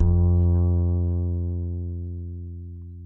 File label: acoustic bass vib F2; Masterbits CLIMAX 7 - Bass